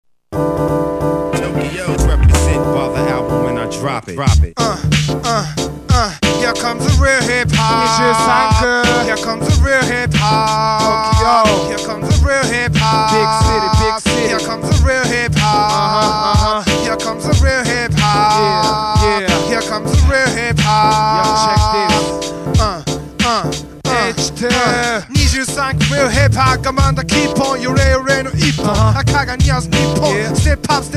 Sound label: Real Hip Hop